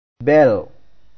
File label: bell